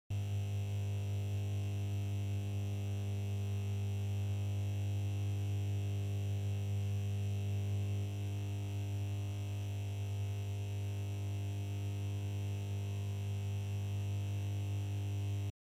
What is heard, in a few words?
Fluorescent Light Noise Hum